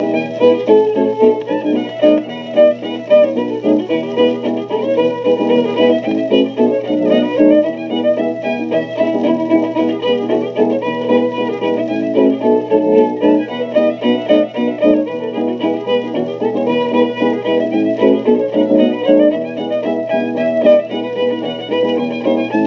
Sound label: Clog Dance